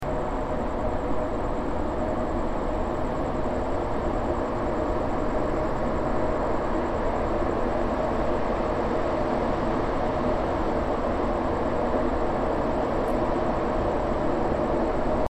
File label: noise